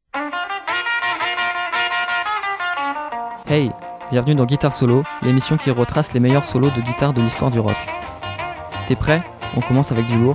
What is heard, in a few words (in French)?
Guitare Solo